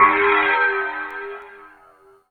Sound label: BIGPIPE; EFFEKTE; MAGIX